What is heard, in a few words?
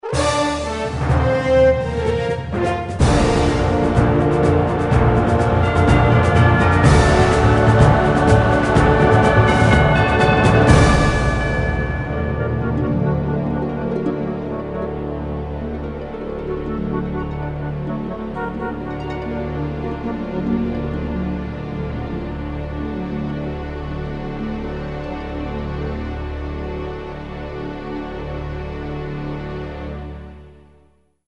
Protoss Victory; StarCraft Remastered: датамайн